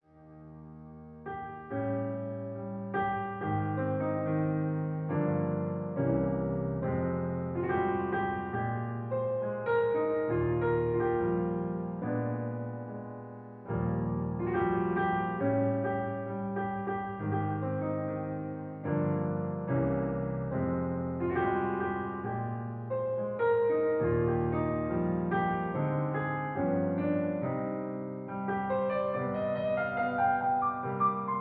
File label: Jazz; Usen; Fist of the North Star Lost Paradise; Hokuto ga Gotoku; PlayStation 4 gamerip